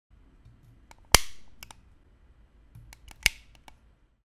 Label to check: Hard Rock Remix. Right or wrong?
Wrong